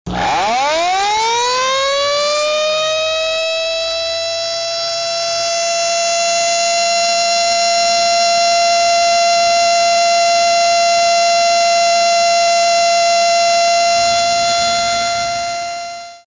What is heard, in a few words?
siren; kikikanrika; musen